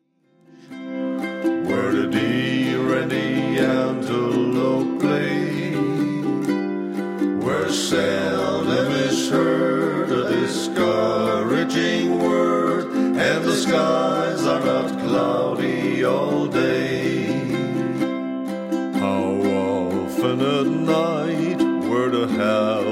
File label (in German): home on the range ukulele gesang